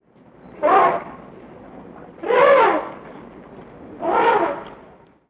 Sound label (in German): Afrikanischer Elefant Trompeten; Guenter Tembrock